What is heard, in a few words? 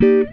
GTR CHORD; Univers Sons; Jazzistic